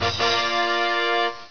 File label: Win; lianliankan